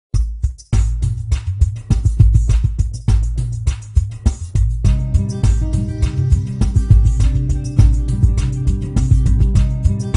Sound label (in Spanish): Música fondo página web